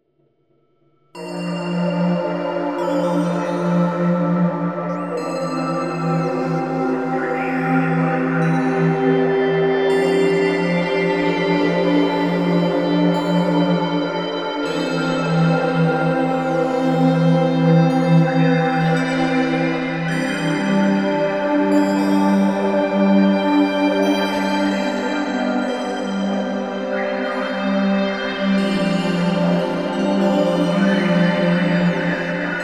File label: melody